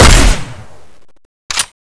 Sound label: shoot empty; sven; custom weapons; cso; augex